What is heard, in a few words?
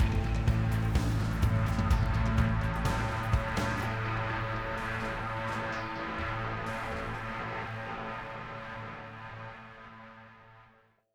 Fireworks Outro 126bpm; battlefield hardline; ps3 ps4 windows xbox 360 xbox one